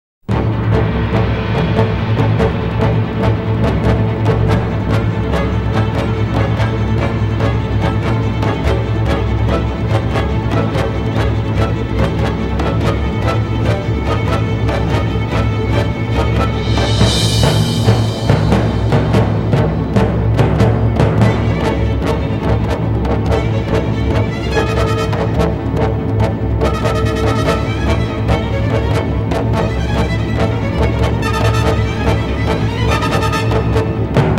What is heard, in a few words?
tension